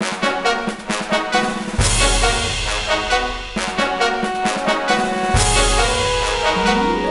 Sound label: Imperial Victory; Lego Battles 2009 NDS